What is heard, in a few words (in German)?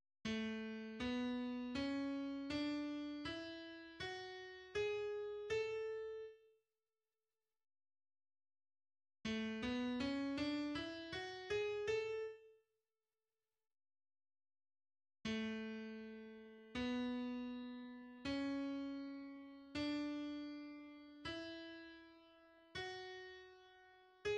tonleiter; Musik; Realschule